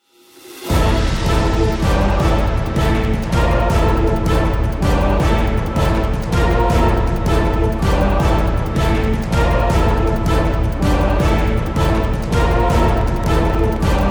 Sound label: bgm orchestra; maou